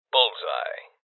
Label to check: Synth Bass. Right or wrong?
Wrong